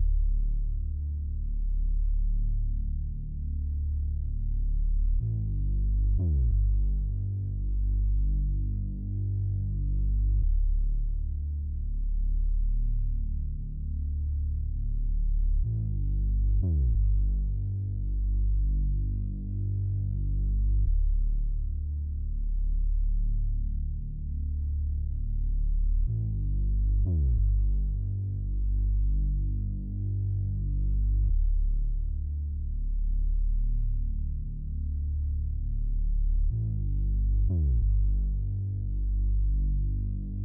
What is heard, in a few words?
92 BPM D Min Bass; Melody; Loop Stems; Cymatics; 2022 Melody Collection Platinum Expansion - Pt 2; Top Floor